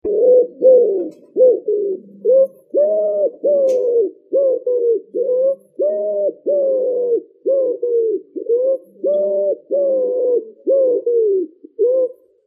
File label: wood pigeon